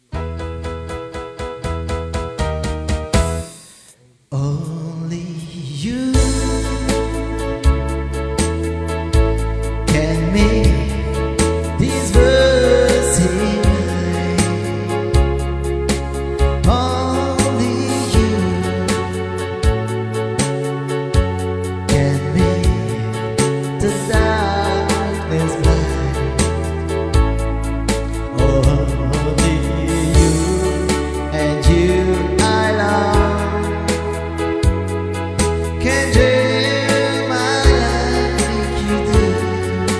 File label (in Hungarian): tanc